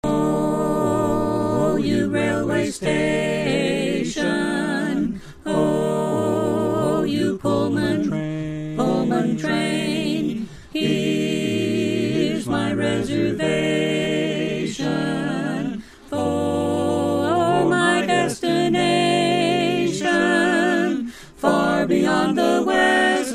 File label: tenor